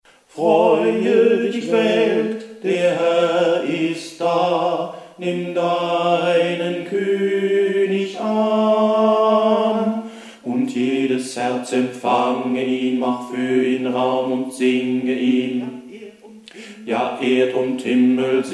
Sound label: bass; FreueDichWelt